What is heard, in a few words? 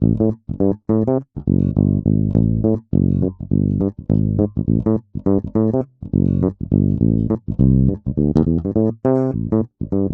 Bassline; Moonkits